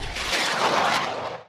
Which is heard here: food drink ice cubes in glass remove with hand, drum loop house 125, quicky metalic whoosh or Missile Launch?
Missile Launch